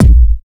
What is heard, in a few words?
hard bass drumz